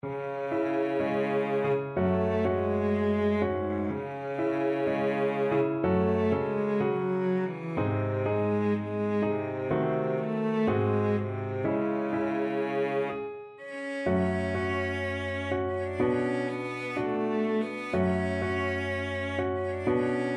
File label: Despair Piano and Cello Sketch